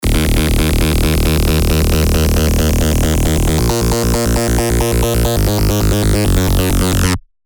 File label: Mosh Pit, techno bass; revealed spire techno bass vol 1